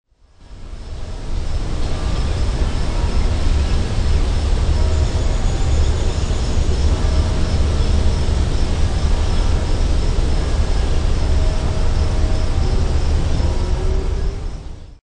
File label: church bells